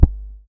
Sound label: bounce; Amulet Manual